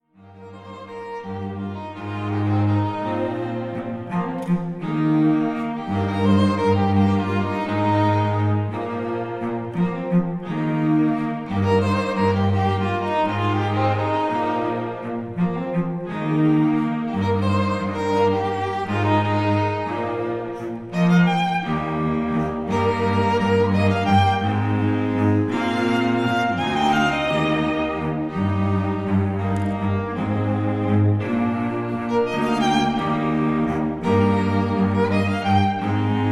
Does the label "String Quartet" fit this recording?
Yes